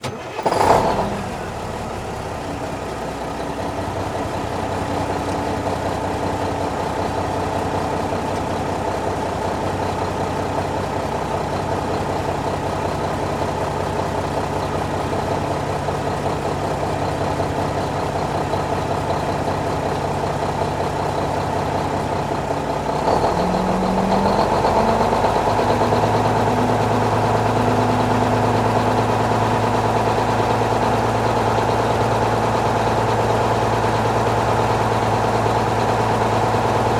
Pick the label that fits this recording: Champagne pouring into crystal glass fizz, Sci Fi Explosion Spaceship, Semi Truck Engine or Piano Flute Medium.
Semi Truck Engine